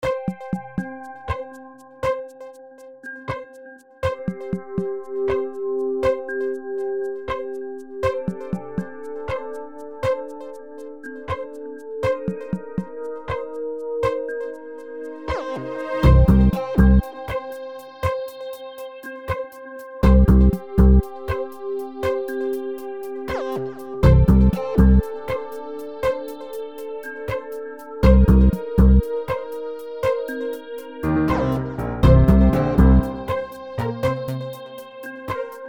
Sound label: cello